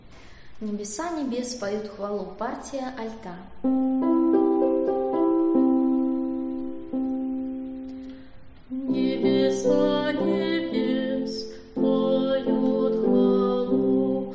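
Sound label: alto; Nebesa nebes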